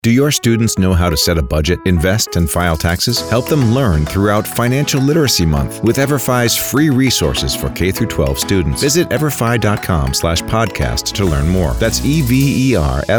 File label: music; everfi